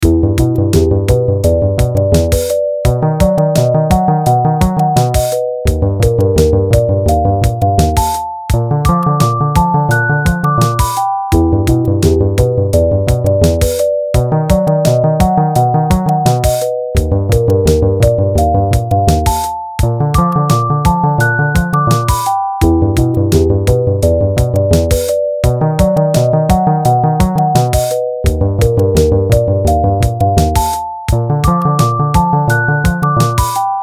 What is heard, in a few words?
beat